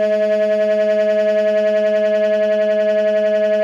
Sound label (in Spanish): Tremolos